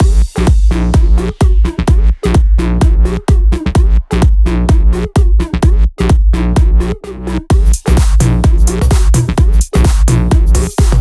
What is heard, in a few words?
Mix, 128BPM; Pee Dee Mix